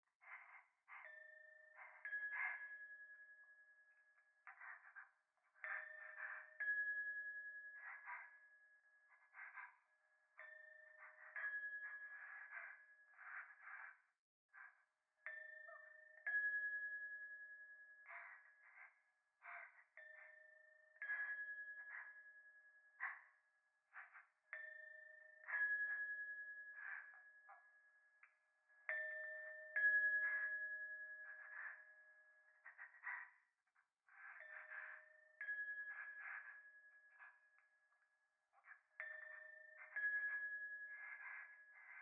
風呂 左耳快感吐息 神社

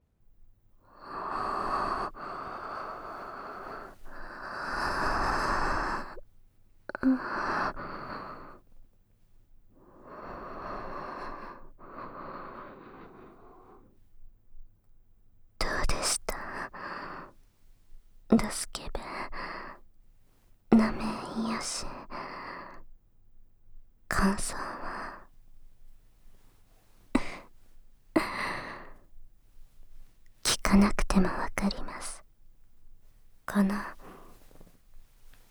耳奥舐め騎乗位＆指クリーム両耳責め密着騎乗位, ASMR

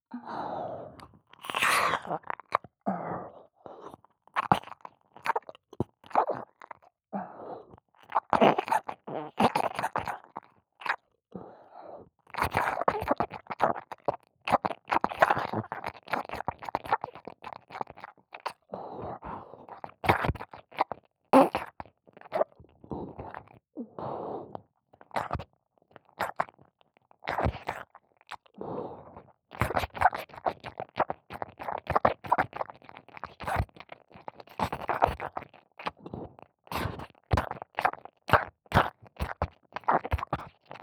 耳舐め・普通・左耳; マリエッタ

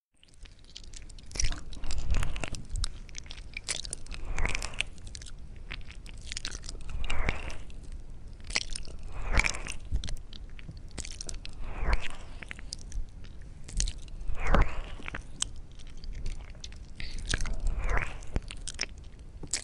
お姉さんの唾液たっぷりな癒しの耳舐めASMR

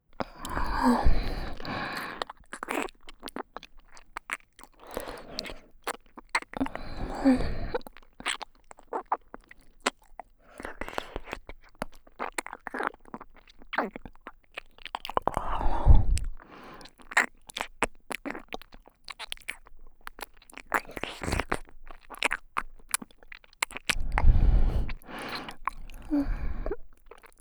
エロ吐息多めの密着耳舐め※右耳ループトラック, ASMR

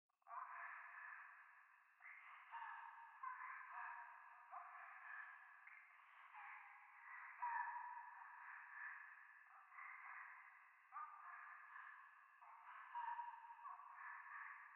廃墟 右耳密着処女喘ぎ 山奥夜

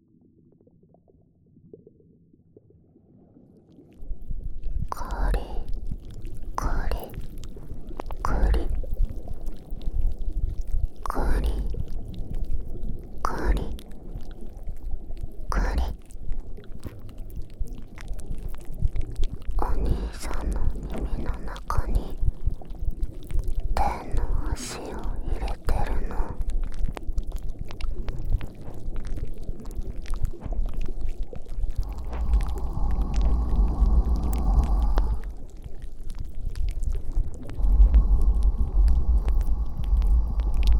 安息触手耳かき【BGMなし】

